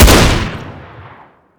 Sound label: m16a4; garrysmod